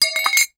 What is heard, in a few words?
Metal Clang